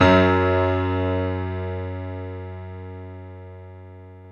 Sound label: PIA MICRO, MICRO-PIANO, Roland; Sound & Vision - Gigapack II